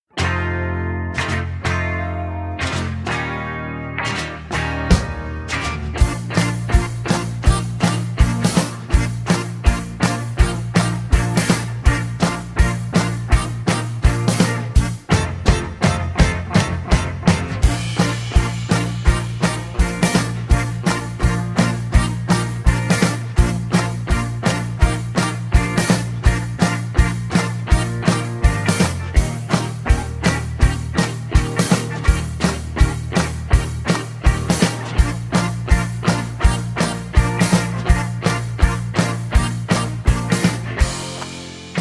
Bb No Backing Vocals, backing tracks

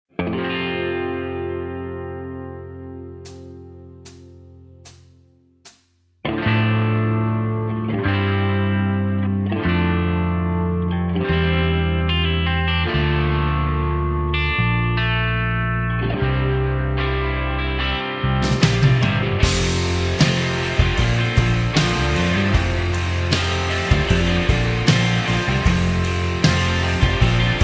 (F) No Backing Vocals

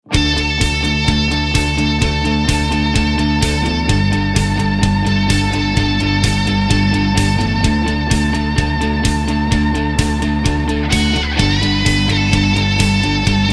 D No Backing Vocals, backing tracks

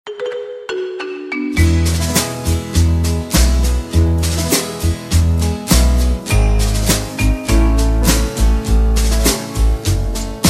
(A) No Backing Vocals

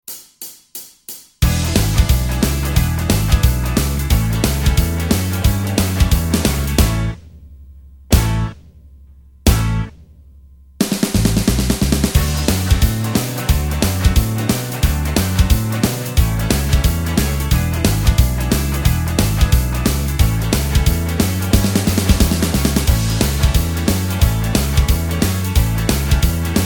A No Lead Guitar, backing tracks

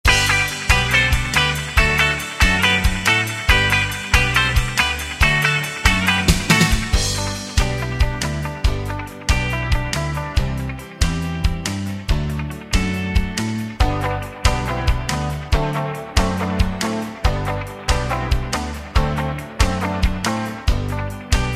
G, backing tracks

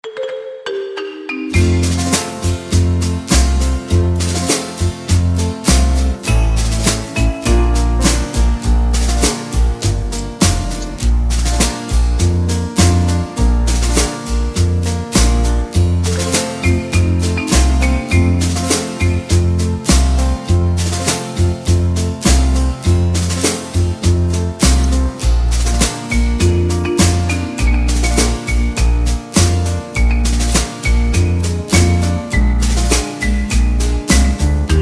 (Bb) No Backing Vocals

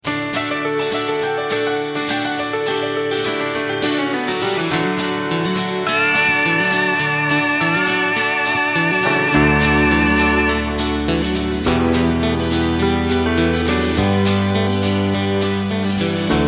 D No Backing Vocals, backing tracks

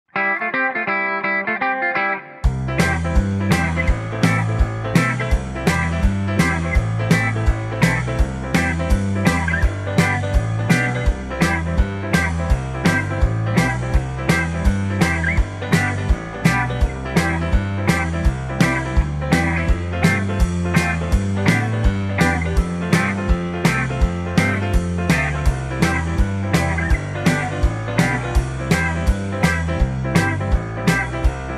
(C) No Backing Vocals

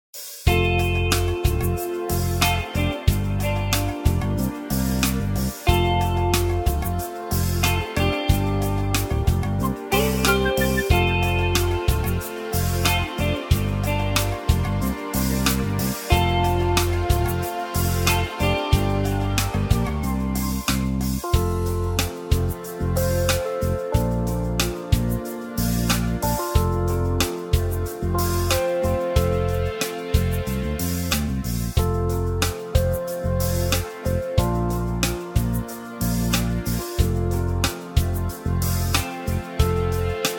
(F) No Backing Vocals

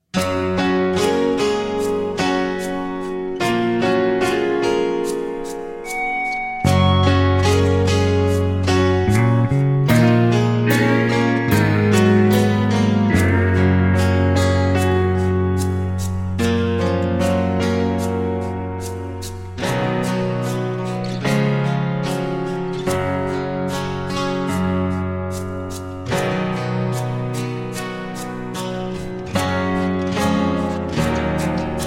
Gb No Backing Vocals, backing tracks

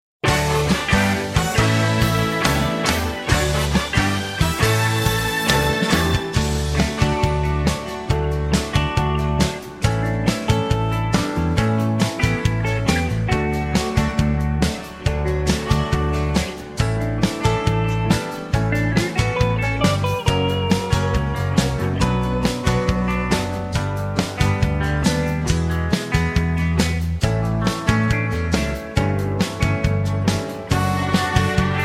(D To Eb) No Backing Vocals

